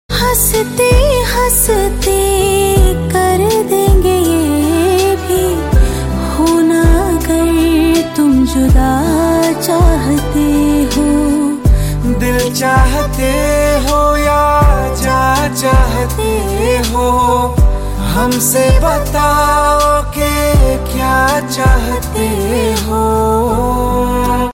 female

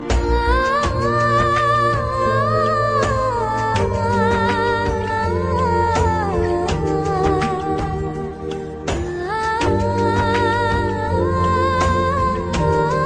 heart broken voice

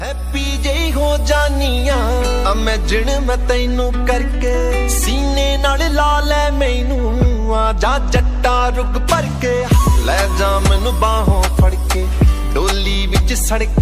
Feeling song Ringtone

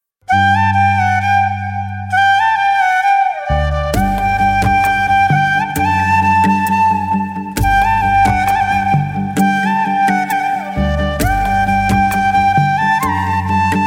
Flute Theme for Ringtone; LUCIA

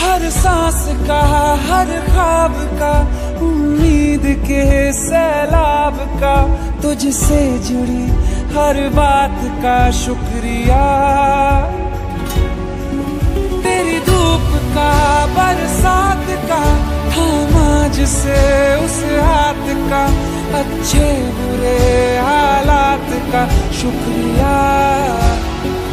Song Ringtone

